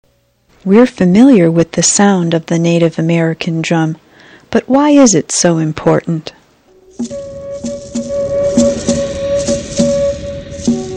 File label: drum